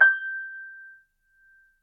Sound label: Grand Piano, Kawai, Sustains, G5; Chordophones